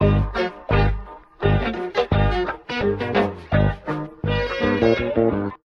keys and guitar; Phonky

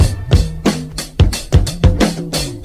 bouncy house, Drum loops Boombap

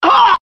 ugh male; ooh ahh; human

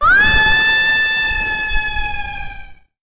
scream

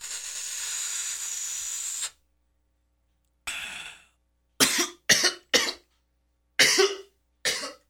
smoking

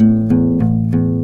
guitar

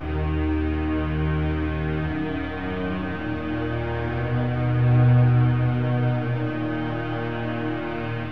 strings, Sad

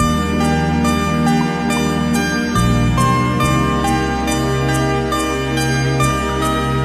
keys, jazz loops

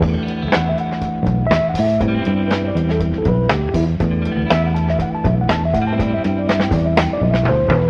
full, Loops Phonky

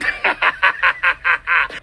laugh evil; ooh ahh

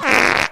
fart short

